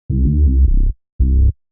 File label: gentle stylish soft basses; bass wet; Loopy Pro